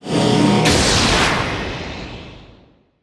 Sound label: sword loop